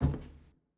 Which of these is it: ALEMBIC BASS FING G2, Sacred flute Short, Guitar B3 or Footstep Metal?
Footstep Metal